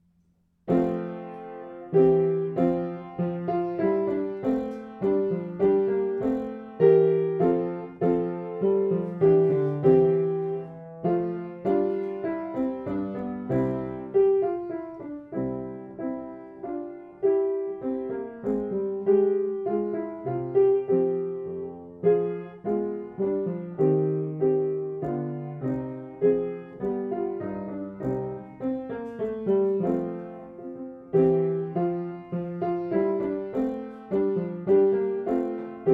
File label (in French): Arioso accompagnement; evaluation fin de 1er cycle